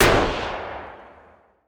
heavy gunshot; factorio; Factorio 0.14.21; fight

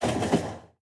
train tie; factorio; Factorio 0.14.21

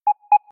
alert construction; factorio; Factorio 0.14.18

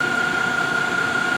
transport belt working; factorio; Factorio 0.14.21

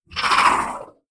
biter call; factorio; Factorio 0.14.21; creatures

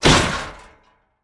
car metal impact; factorio; Factorio 0.14.21

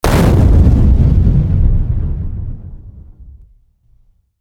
large explosion; factorio; Factorio 0.14.21; fight

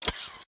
roboport door; factorio; Factorio 0.14.21

